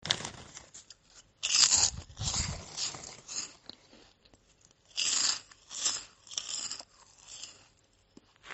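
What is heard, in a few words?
puff asmr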